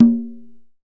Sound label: conga